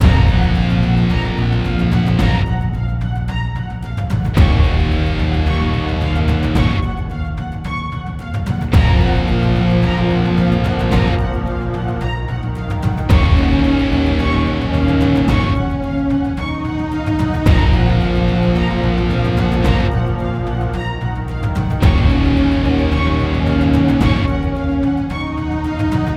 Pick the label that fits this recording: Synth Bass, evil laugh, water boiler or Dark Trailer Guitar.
Dark Trailer Guitar